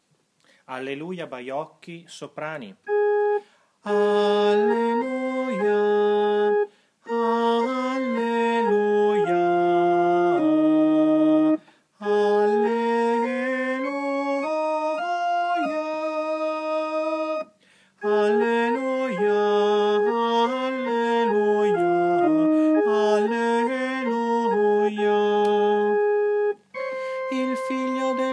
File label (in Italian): Soprani